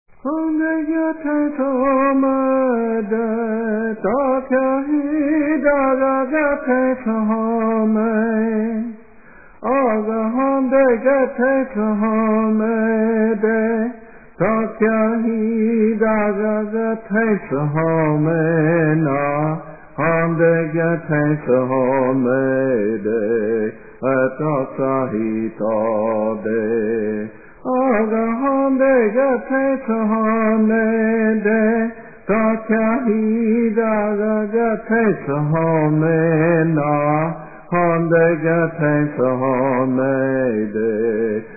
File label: native american music